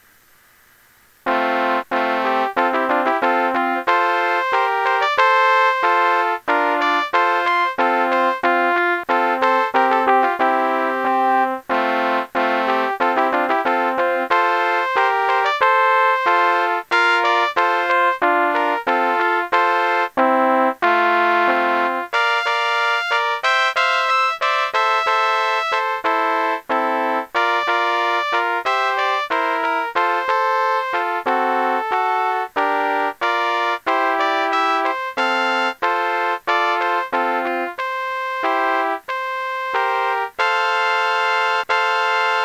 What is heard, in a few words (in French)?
musique pour un mariage entree